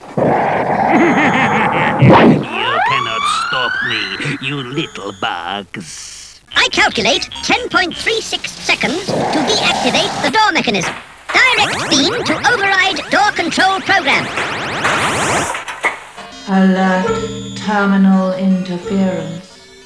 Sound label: door; classictv; telebugs